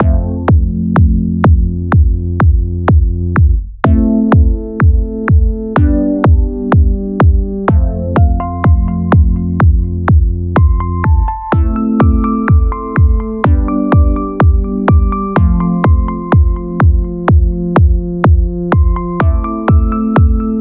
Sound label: Track With Vox